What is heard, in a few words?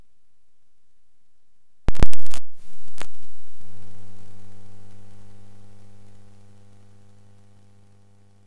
Plugin